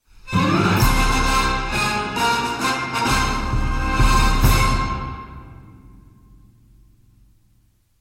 victory theme orchestral

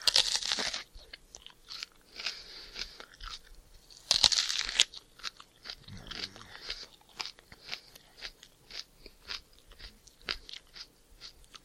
eating noises

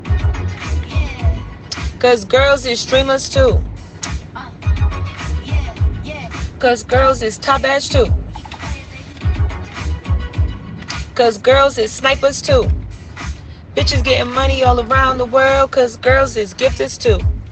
singing

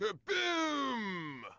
kaboooom

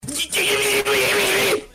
war cry

